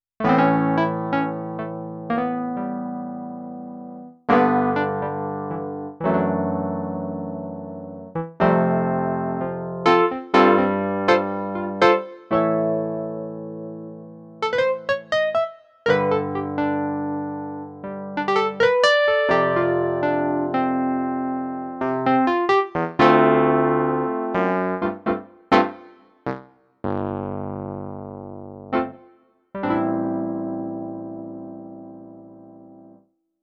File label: EPiano Variation; CLP470